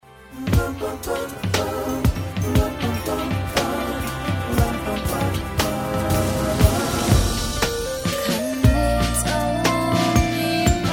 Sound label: Little Drummer Noise